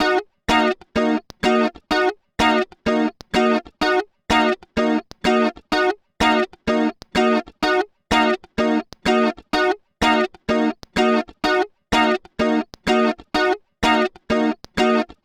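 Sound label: guitar; juno9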